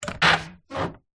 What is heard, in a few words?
household window jalousie open squeak modern